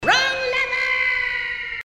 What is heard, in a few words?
lever